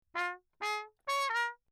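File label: trumpet mute Lechner Bach phrase; Perinétventiltrompete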